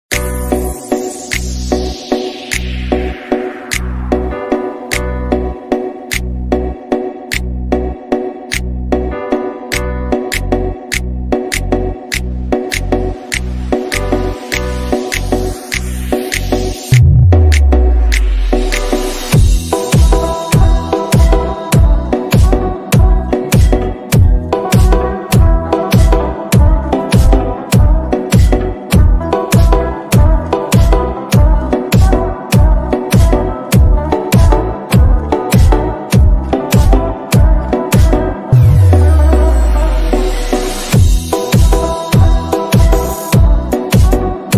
azeri bass music meyxanalar

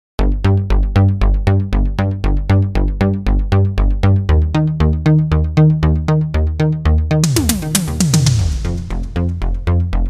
italo disco instr cover

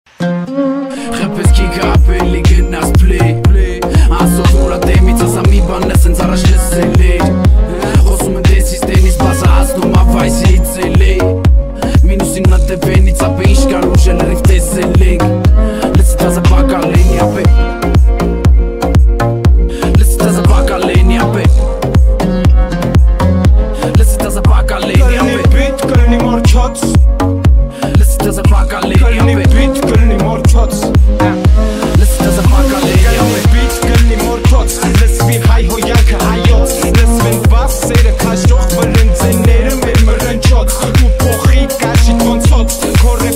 beat remix

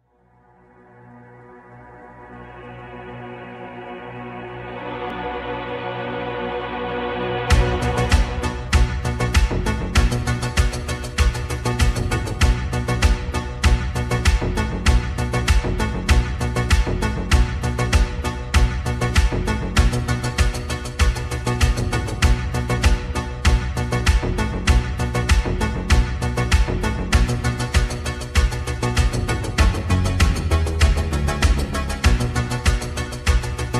extended version slowed reverb

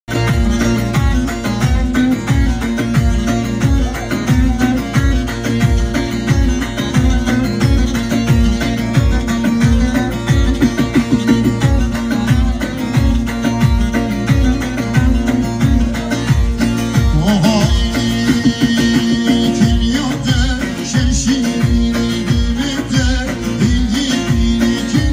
kurdish; cizre

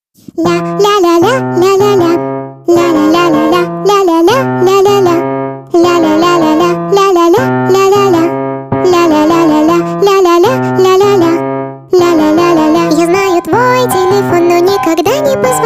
jazz speed up nightcore

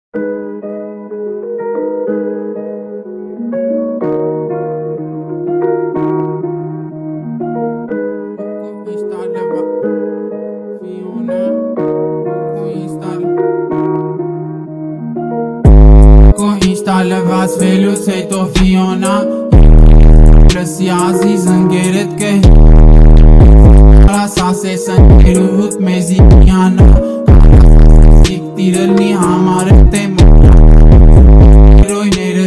bass bosted

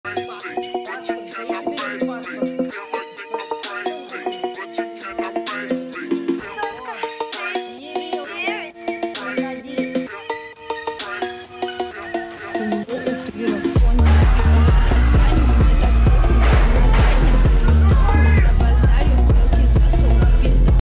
fonk drift

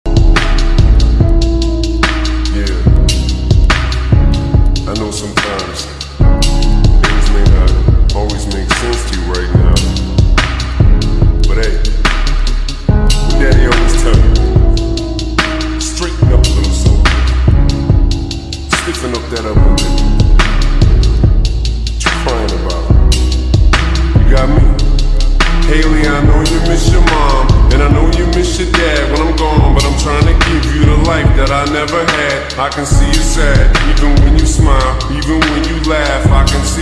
slowed down

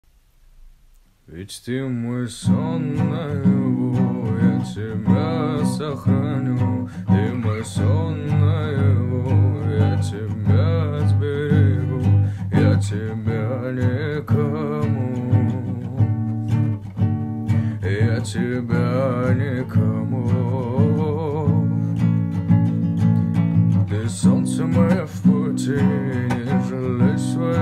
cover gitara kirgizstan

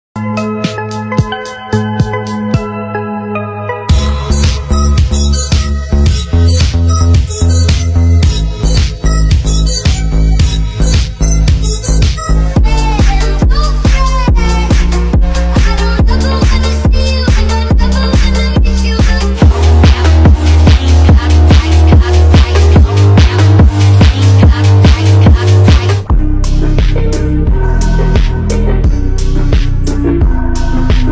phonk

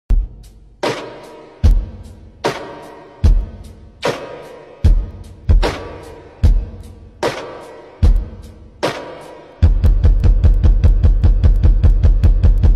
slowed core arabic slowed

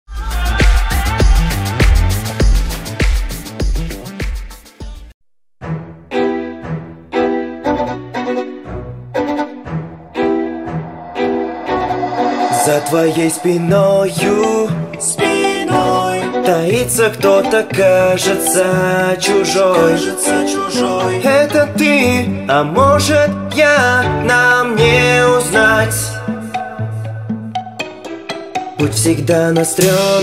song russian cover; among us

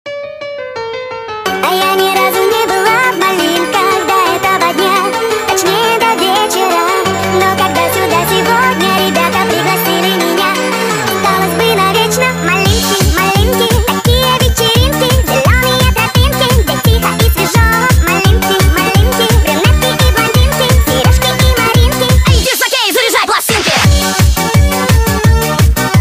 speed up

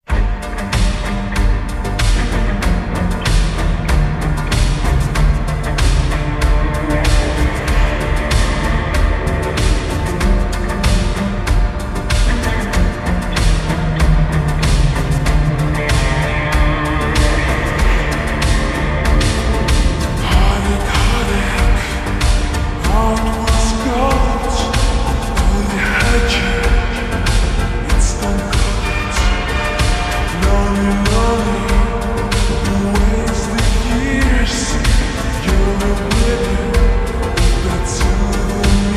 slowed n reverb

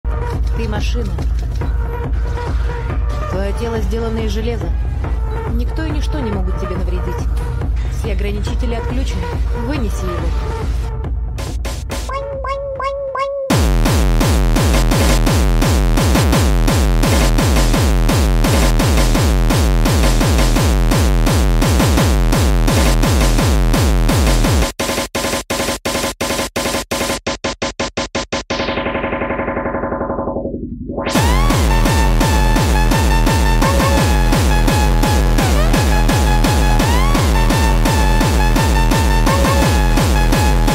hardstyle

